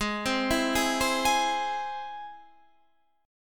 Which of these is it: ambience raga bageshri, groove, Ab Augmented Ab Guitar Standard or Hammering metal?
Ab Augmented Ab Guitar Standard